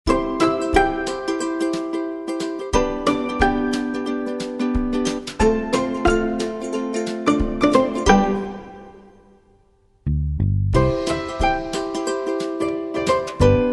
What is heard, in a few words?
corporate